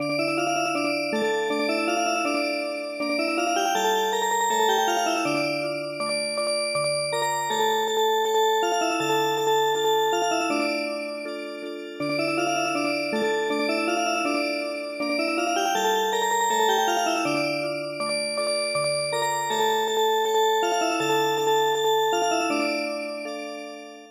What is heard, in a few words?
Music Box, traditional; 中山晋平の「黄金虫」に癒し系のアレンジを施したオルゴール曲を聞くことができます。; 「黄金虫」は、スローテンポな4拍子の日本の伝統曲です。